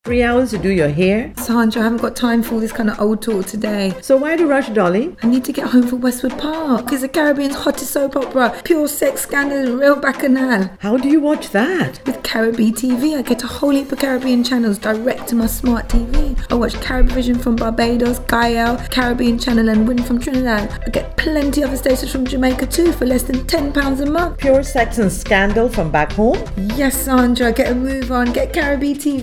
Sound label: hairdresser music